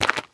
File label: Cave Footstep; Devour; The Inn